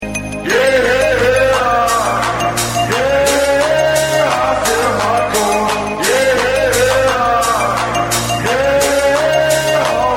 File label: hardcore